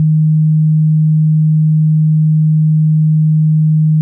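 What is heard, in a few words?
Clean Sine DX100 D#0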